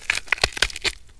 foley, footsteps; physics; undying

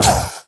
snake impact; weapons; dota; medusa

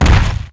walk, footsteps; earthshaker

